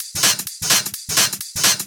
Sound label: House loops 128BPM; Vengeance Samples